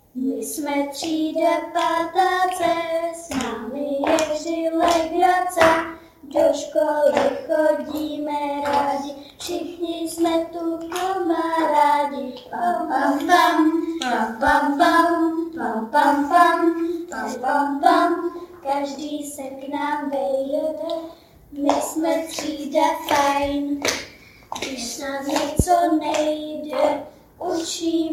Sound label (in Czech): zpiva